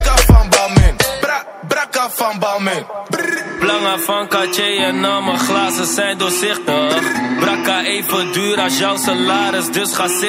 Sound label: dutch ringtone